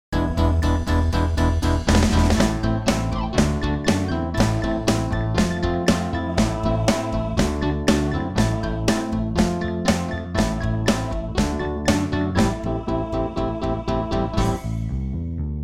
Hohner pianet n; pianoteq; Modartt-Pianoteq; Hohner Collection

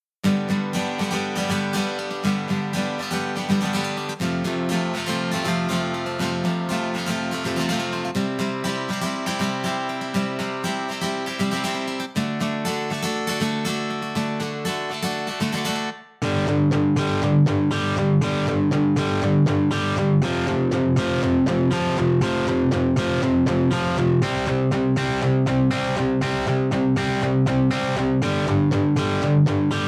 loops, Strum GS2; AAS; Aplied Acoustics Systems

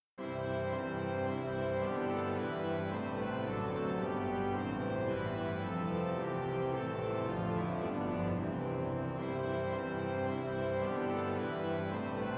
organum venezia; Best Service; V3SOUND; Symphonia Concert Library

